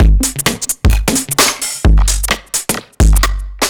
Beat VT Echo 130, distorted drum loops 130bpm; musicradar

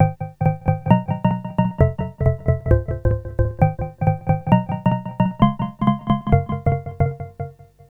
Synth hits and riffs

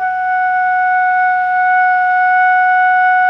SOPRANO F#4, Saxophones; PP; Greytsounds; Philip Wolfe David Crozier's Saxophones

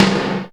Reverb Kick Snare; Percussion; Dance Synths

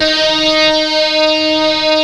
stick, Chapman Stick; Analog Odyssey

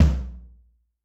Amb Tom F, Yamaha DTXpress-II

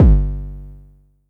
Low Kicks G1; LIMAT Div Dept; Drums

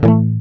GUI A, Acoustic, Roland

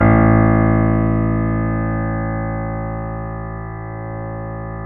BOSENDORF

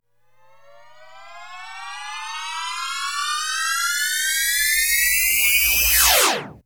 STINGER, Trance; ILIO; Trance Fusion; STOP LONG

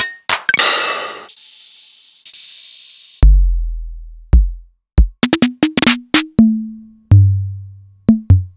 Korg Volca Beats

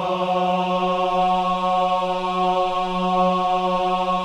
AH F#3, CHOIR OHS; Spectrasonics; Symphony of Voices

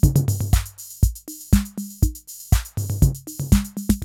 CR-8000 LOOP, DRUM LOOPS

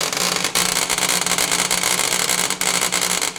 TESLA, Science Fiction

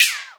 SFX, Techno Trance; Combo 1; E-MU Formula 4000 Series Vol. 2 – Techno Trance

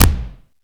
bass, AKAI XE-8; Greytsounds

